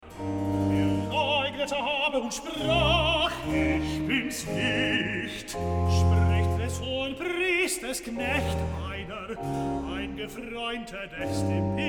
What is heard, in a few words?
Recitative; Bach, J S; Johannes-Passion, BWV 245, Pt. 1; XVIII. Recitative. Er leugnete aber